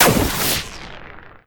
Short Burst; U L Short Burst 15

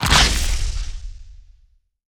Crater Tower Crack; unsighted

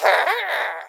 Imp Prepare

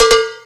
Sfx Winter games

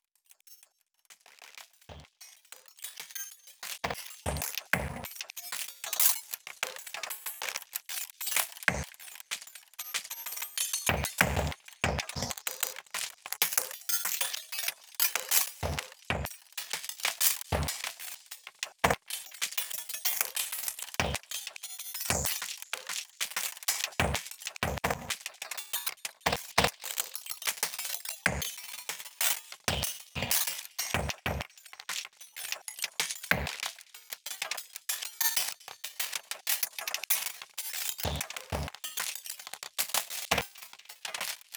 Music Castle GLITCH; CP Music Biome2 CastleGLITCH Phase03

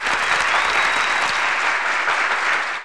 Hand Clap; Knight Online

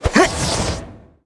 Wizard M dash

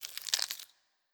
Servant move Bones

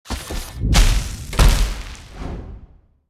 Freezing Fury; starbites

